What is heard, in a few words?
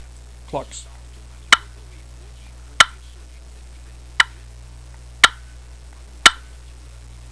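mahogany clucks, turkey call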